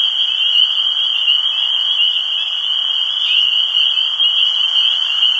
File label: frog cricket loop, swamps; ambient